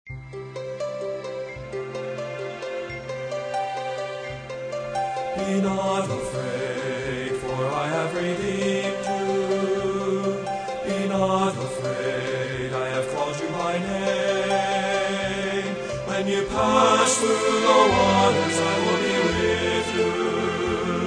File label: Trio